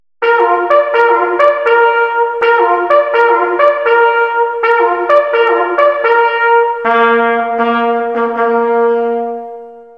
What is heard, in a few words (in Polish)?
sygnaly Naganka Naprzod